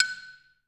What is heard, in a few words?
XY LONG F#5, orchestra; Advanced Orchestra; Best Service; Peter Siedlaczek's Advanced Orchestra